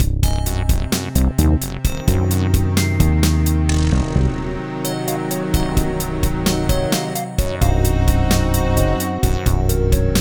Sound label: Mysterious Bells